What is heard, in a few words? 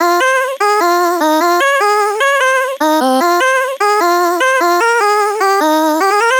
Vocal Chop Loop 150 F; Vocal Loops; Ghosthack Advent Calendar 2018 Day 9